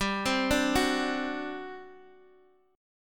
G Major 7th Suspended 4th, G, down, Guitar, Half Step Down; GM7sus4